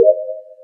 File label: task complete; among us